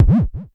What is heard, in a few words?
TOM, Klone Dual-Percussion-Synthesiser